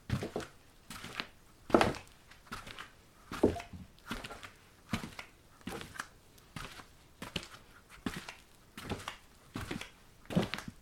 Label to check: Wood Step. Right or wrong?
Right